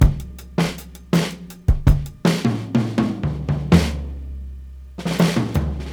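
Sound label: 81 bpm, beat loops; Zero-G; Monster Beats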